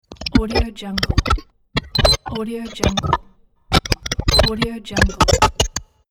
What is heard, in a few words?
glitch sfx